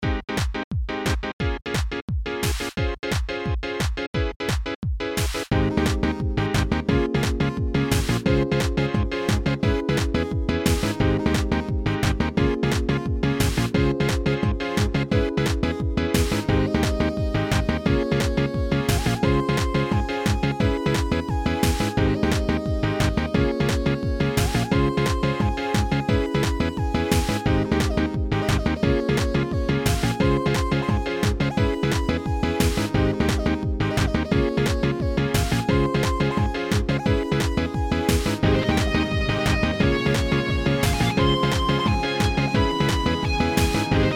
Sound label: Jam N Jig Looped